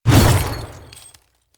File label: Claw Swipe; Bromius